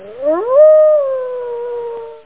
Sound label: howl